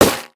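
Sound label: Attack Spark Effect; Dragon In A Box; destructibles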